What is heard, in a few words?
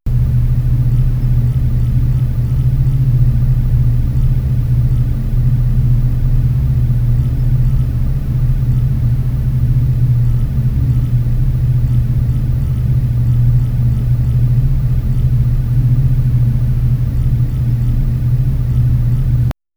large empty hall room ton